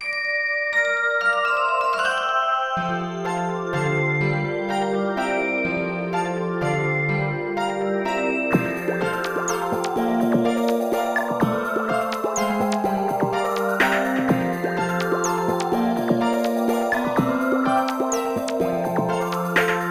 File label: Underwater; super mario maker 2; SMW Underwater - Edit